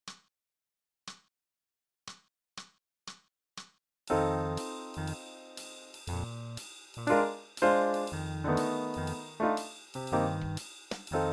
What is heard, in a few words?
Blues Bass